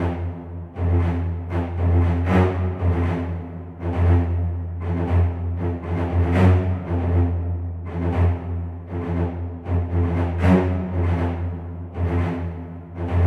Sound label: cello, 118 bpm